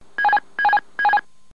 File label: Airbus AP Disconnect